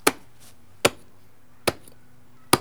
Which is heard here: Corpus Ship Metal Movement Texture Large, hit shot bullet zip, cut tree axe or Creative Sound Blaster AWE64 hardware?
cut tree axe